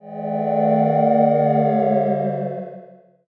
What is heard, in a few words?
cheer far